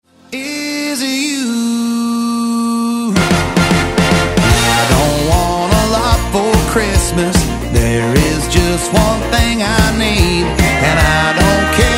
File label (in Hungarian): country; adventi